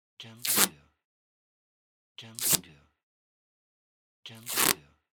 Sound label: Scotch Tape Thin